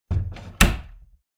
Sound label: closet door close